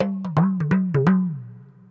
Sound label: PERC LOOP ETHNO 125